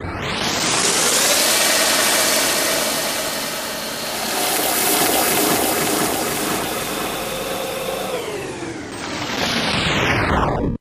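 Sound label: Computer Start Up Crash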